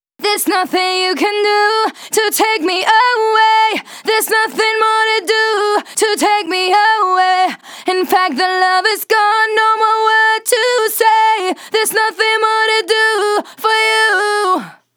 Female Vocals DRY, 126 BPM; Vengeance Samples; Vocal-Hook Kits; Song Kit

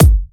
Bassdrum; Vengeance Samples; VEE Bassdrums

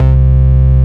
Basses C; Vengeance Samples

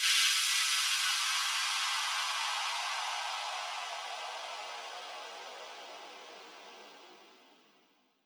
Downsweep 140BPM; Sound FX; Vengeance Samples; D minor

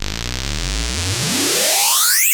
Sweeps Medium

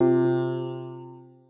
synth poly c2

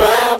Synths B; Vengeance Samples

